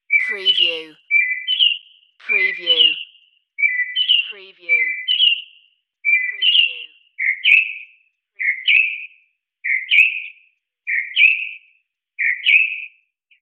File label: Mocking Bird 2 Calls